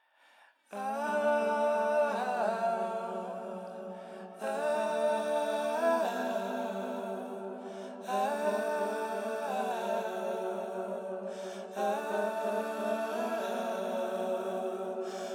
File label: Song Vocals Mixed